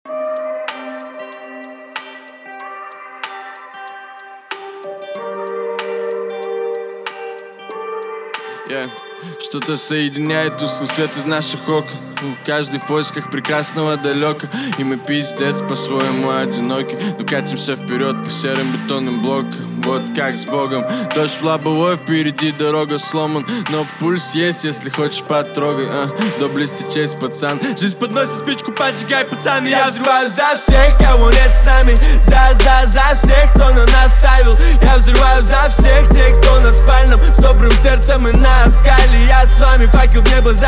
41-46hz Low Bass

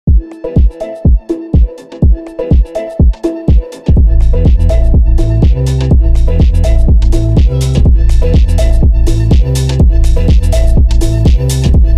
PHONK REMIX BASS BOOST

